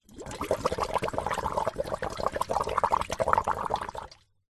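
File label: household water in metal pot bubble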